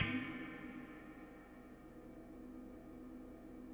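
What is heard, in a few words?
Cymbal loop, Yamaha DTXpress-II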